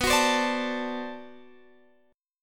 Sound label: D 7th, B